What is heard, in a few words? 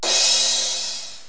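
CYMBALS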